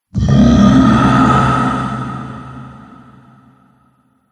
Zombie - Moan; Zomby; horror

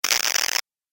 Static Electricity Charge; Miscellaneous Electronic

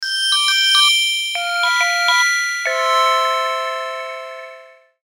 Logo Airy Low-Fi; Airy Sound Effects; Blastwave

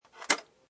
Cassette Open, Cassette player opening.; Tape Players; Electronics; Cassette Player Opens

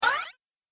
Thin Rising Hit Sound; Thin Rising Hit Sound With Delay; Miscellaneous Impact Sound Effects

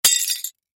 Picture Frame Glass Break; Breaking Sound Effects; Blastwave; Foley

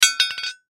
Metal Pipe Drop, Metal Pipe Drop Fall; Drops; Foley; Objects; Blastwave

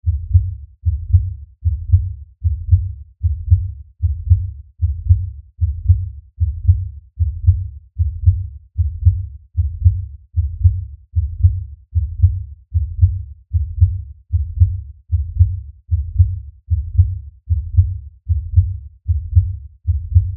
Lfe Beat Low Bass Pounding Rhythm; Miscellaneous Musical; Blastwave

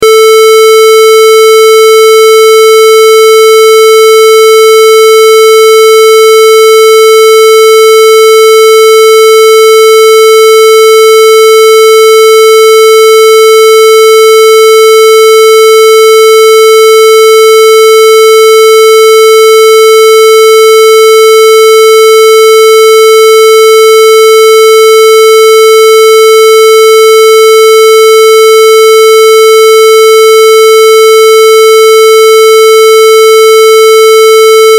Test Tone Square; Wave 440Hz 60 Secs 0Db Loop; Blastwave

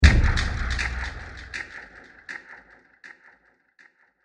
Short Impact With Delays And Echos; Miscellaneous Impact

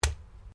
ORGANIC STAB WET; Various Organic Foley sounds; Stabs and Slices; Combat

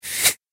Robot Fast Zip; Robot Sound Effects; Sci-Fi Robot Sfx; Andrew Fleming

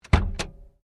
Hyundai Accent Bonnett Latch Open; Hyundai Accent 20 08; Hyundai hire car; Vehicles; Cars

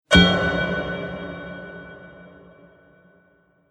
Treated Metal Hit; Metal Sound Effects; Impact

